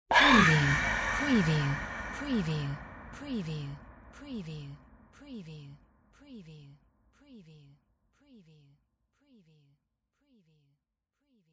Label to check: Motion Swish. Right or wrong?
Wrong